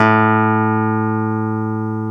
KEY X Hohner Clavinet

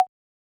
Yamaha DJX 2B, T8 Conga

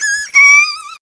VOC, Drum & Bass, 160 BPM; Ueberschall; Drum & Bass Flash Trackz

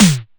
Snare, DIGITAL KIT

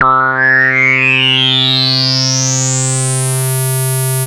Anasound Long mid C, Analog Single Notes; Sonic Foundry (Sony Creative Software)

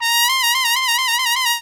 THE TRUMPET

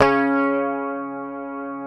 PICKED, Bass, C#3; Sampleheads; Will Lee Bass Library

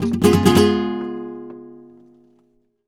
Guitar, Flamenco, c#; Best Service ProSamples vol.46 - Flamenco; Frase

